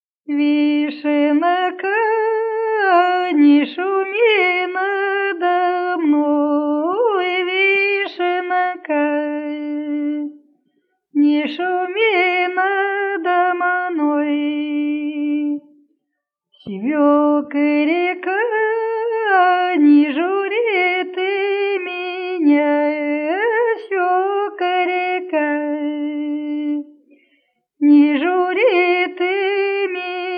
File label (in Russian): Вишенка, не шуми надо мной жнивная